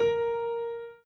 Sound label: Piano Chord